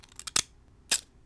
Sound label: Jagged Scissors; bb - Smol Mechanisms